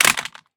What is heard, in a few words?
pistol drop concrete; STALKER; IWP